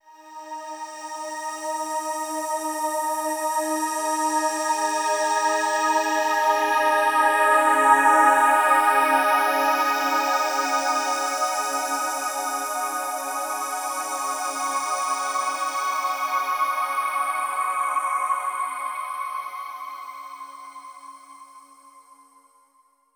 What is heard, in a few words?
Hi Complex, Complex Wave; Sonic Foundry; Sony Creative Software; James Johnson Spektral Minimalism